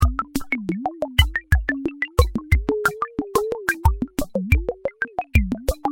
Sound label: electronord